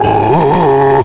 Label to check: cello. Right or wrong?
Wrong